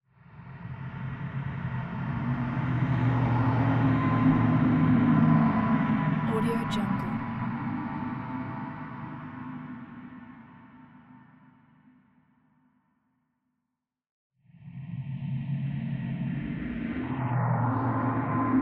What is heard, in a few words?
horror woosh